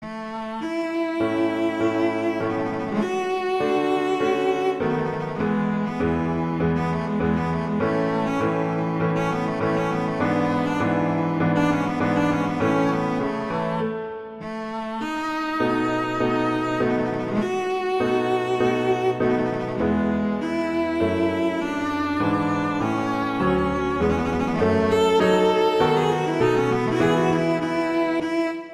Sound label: Largo; Lanzetti; Sonata